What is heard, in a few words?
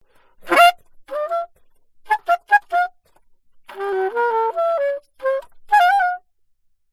altosax